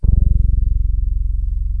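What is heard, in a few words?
bass guitar E3, jazz bass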